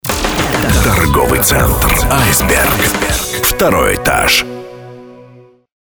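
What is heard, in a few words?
jingle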